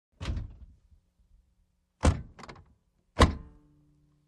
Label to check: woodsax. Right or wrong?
Wrong